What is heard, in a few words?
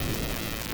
laser burn; weapon